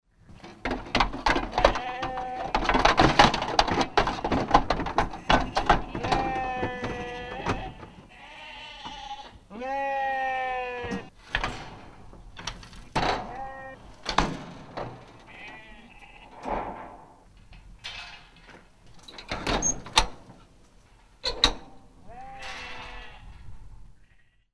sheep walking up into livestock trailer and tailgate shut behind them